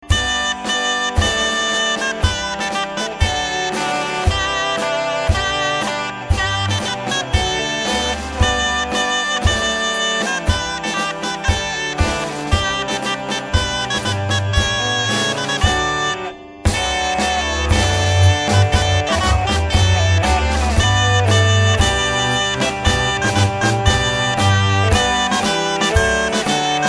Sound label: Trumpet Tune; Hofgesindt